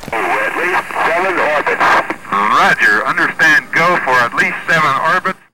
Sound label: orbit, space fx; best service Blue Box